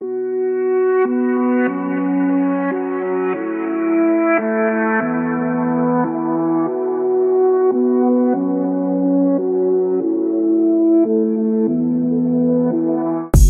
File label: Instrumental Rap Hip Hop Beats Sad Rap